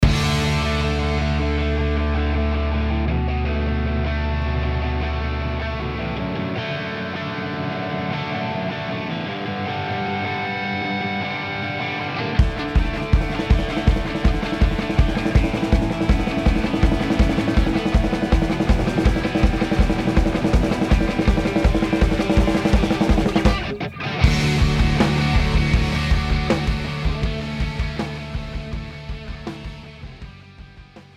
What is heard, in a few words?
Original Snare Roll